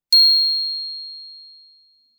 bells brass ff C8; Percussion

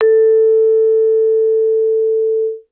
Vibraphone short sustain ff A4 stereo; MIS Pitches - 2014; Percussion

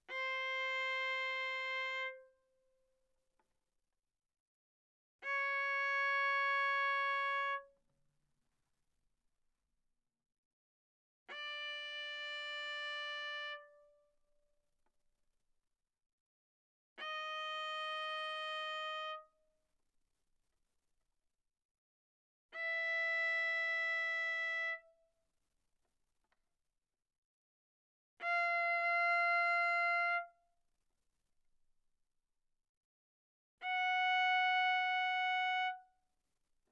Viola arco sul A ff C5 B5

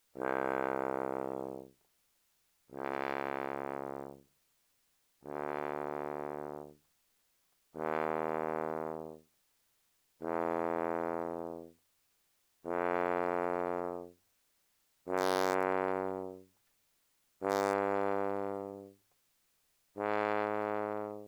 Tuba, ff, C2 B2; Brass

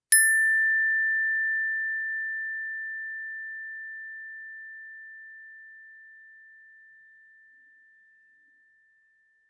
bells brass ff A6 stereo, Percussion

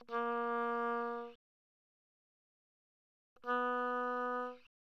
Oboe pp Bb3 B3, woodwinds

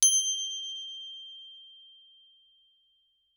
Crotale ff G7 stereo; Percussion; Crotales

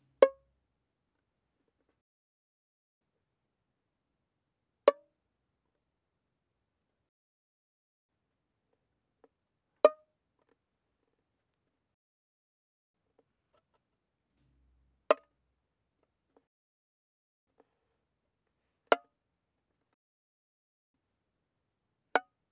Violin pizz ff sul G Db5 Gb5

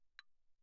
clave, hand percussion; claves

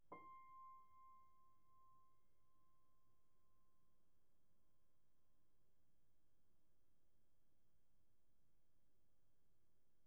Piano pp C6

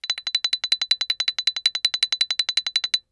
Xylophone rosewood roll ff B7 stereo; MIS Pitches - 2014; Percussion

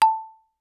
Xylophone hard rubber ff A5 stereo; MIS Pitches - 2014; Percussion

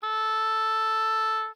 Oboe ff A4 stereo; Woodwinds

